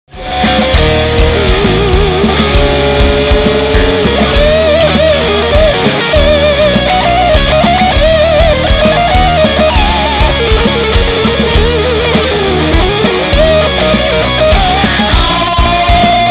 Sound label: Guitar Solo